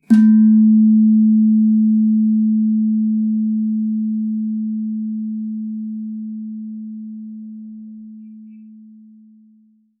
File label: slanthem; Saron